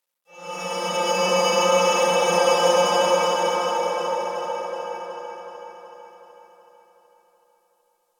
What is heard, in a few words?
Space Exterior Dist Call, Space Battles; Ambience; Warframe